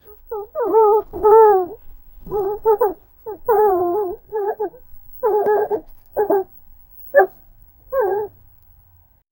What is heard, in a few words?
normal cute dog smile